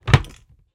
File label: household chest wooden lid move sharp items on top